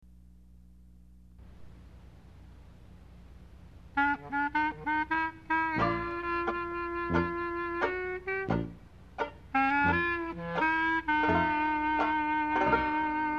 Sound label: orchestra and bands walk